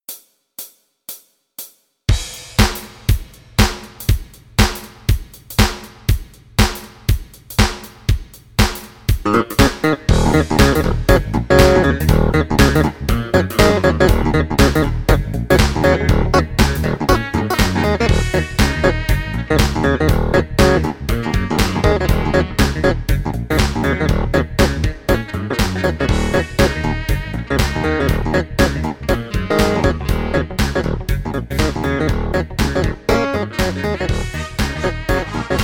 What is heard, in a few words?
Hohner, pianoteq; Pianoteq Hohner Collection